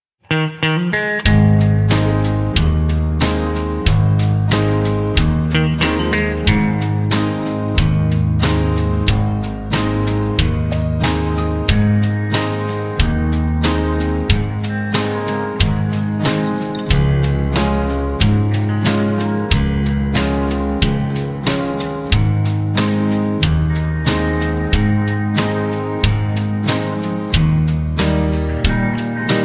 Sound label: (Ab) No Backing Vocals